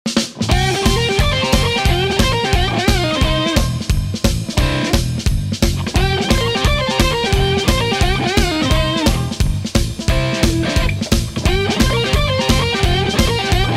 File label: blues